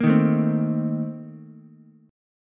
A sharp Major 7th Suspended 2nd Suspended 4th, Ukulele; strummed; JGuitar